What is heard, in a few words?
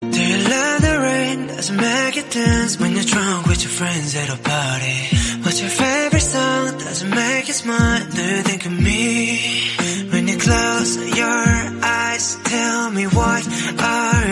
light musical song tone ringtone